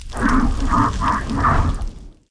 chuckl

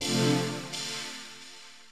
SYNTH PAD HOUSE 125 A; eJay; Sphere

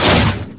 droid hit; DroidWorks demo

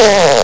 RUDE

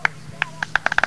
foot

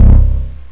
pickbass major

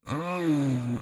groan; Singles Flirt Up Your Life

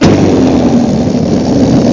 deflate, balloon

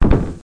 Thawump

